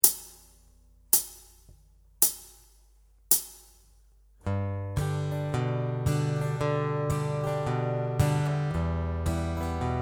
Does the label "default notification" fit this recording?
No